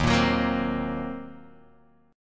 D sharp Minor 6th D sharp, down, Guitar, Open F; D#m6 strummed

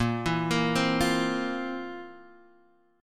F Major 7th Suspended 4th A sharp

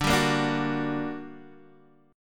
G Minor Major 7th D

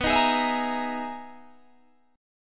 E Major Sharp 11th B

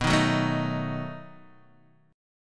B 7th B down Guitar Open D

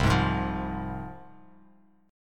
C Suspended 4th Sharp 5th, C, down, Bass Standard; Csus4#5 strummed; JGuitar

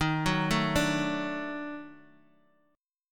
D sharp Minor Major 7th Flat 5th D sharp Guitar; arpeggiated

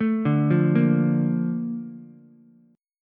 A sharp Major 7th, Ukulele; arpeggiated; JGuitar

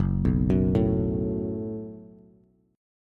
F sharp Minor Double Flat 5th F sharp, Bass; arpeggiated

